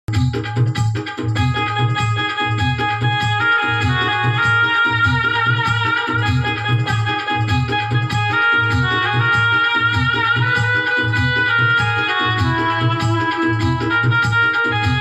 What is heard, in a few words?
banjo